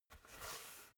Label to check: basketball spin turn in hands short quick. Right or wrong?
Right